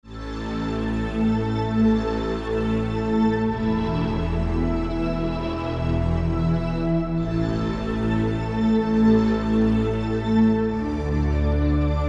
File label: Waverunner Orange